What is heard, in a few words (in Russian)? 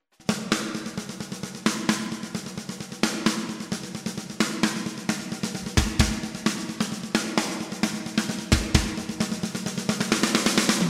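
барабаны в целом; самые острые атаки от бочки и малого. На втором: основной тон бочки, малого и томов, райда. На третьем – оверхэды. На четвертом – обработанные румы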